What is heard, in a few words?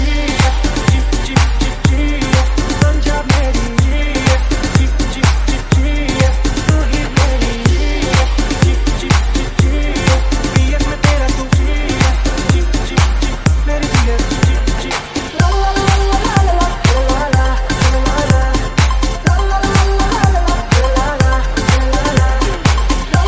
Bollywood Remix Ringtone; ringtones